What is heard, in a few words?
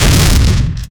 fire elemental roar